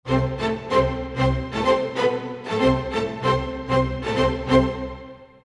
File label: 95 strings, Orchestral arrangements, 95 D min